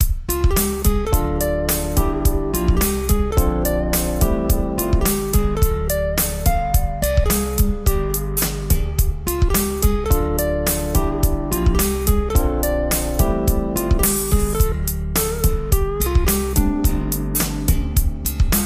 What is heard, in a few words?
blues